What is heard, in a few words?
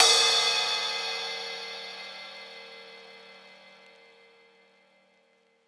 crash; eddyflux; dough-samples